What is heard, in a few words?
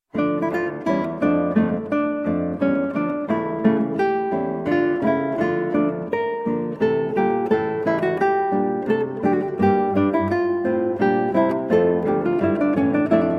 Menuet, Guitar; Recorder Sonata in D Minor HWV 367a; Handel; George Frideric Handel